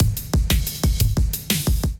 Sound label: Kit, Drums; Collective Species Kit; Ableton; Drive and Glow